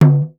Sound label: African, African set percussion; Voice Crystal - Steve Reid Definitive Percussion